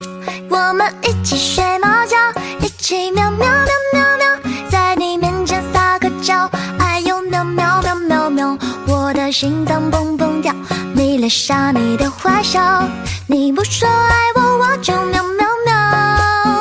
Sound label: meow